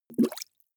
bubbles soft

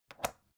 folder open light

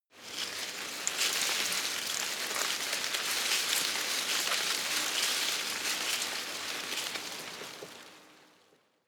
tree small leaves rustle medium

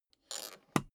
suitcase close latch lock

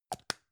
gum plastic box close

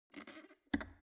plastic water boiler close lid

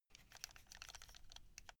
buckle rattle quick

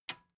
metal button tiny release disable

